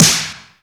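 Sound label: EFEX snare drum, FX DRUM; AKAI S6000 CD-ROM - Volume 1; DRUM RYTHM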